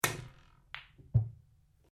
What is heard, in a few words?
Billiards Cue Misshit Cushion, Home billiards table; Pool; Sports